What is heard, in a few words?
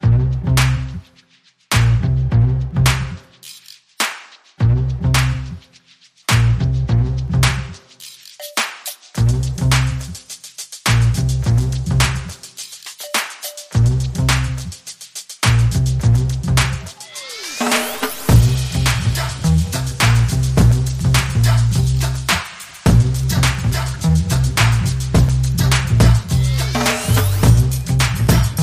Drums Bass and FX Only